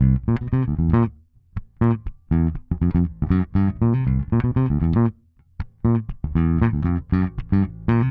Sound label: Bass Hip-Hop